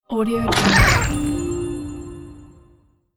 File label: magic critter game hit